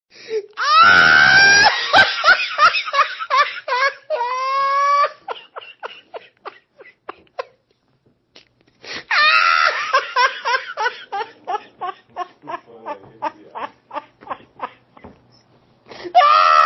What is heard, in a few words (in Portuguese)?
Risada